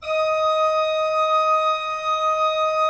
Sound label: D5, Cathedral Organ; ILIO; Propeller Islands